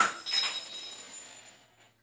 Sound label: glass break, plates